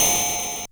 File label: Bent Hat; glitch; Bent Hits